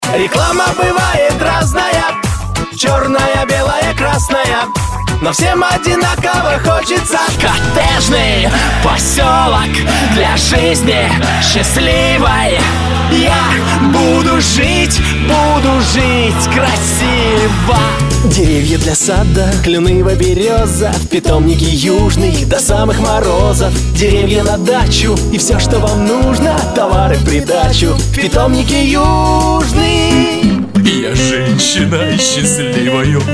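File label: vocal Russian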